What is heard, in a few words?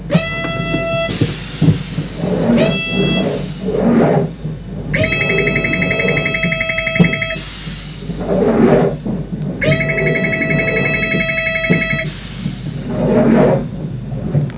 door